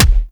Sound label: Weapon Punch Rifle; Warframe; Weapons; Rifles